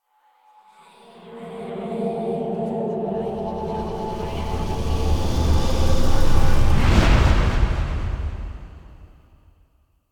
Cave Envy Intro Stinger; Duviri

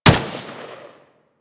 M16 Fire, rifle; Wraith

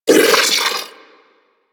Sentient Battalyst Vocal Pain; Warframe; Sentients

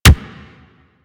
Pulse Laser Punch; Railjack

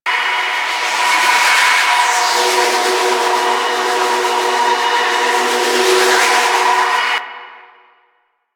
Glyph Trail Loop; Railjack; Wraith Quest; Warframe; Ambience

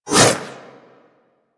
Kunai Whoosh; Stalker Kunai

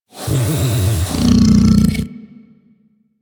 Vocal Breathe Slow; Warframe; Hunting Critters; Undazoa

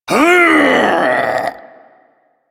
Ability Lift Laugh; Kuva Lich

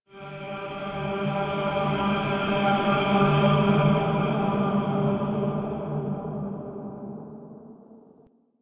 Rand Chant; Warframe; DE; Hubs; Syndicate Arbiters; Tenno

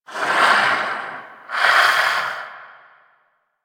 Vocal Breathe; Warframe; Duviri; Dax; Vocal Set A

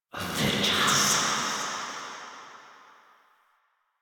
Cave Whispers; Ambience; Orokin; Warframe; Stalker Cave

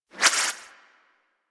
Scoop Idle Spin; Missions; Tenno Ball

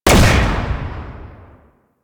Rifle Shot Secondary; Weapons; Bard Rifle Prime Shot Secondary A; Warframe; Tenno

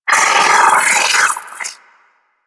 Sentient Battalyst Vocal Attack

